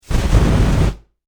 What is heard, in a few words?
Science Fiction Flame Hand Booster Short Burst Dry; Spacecraft Sound Effects; SFX Bible 6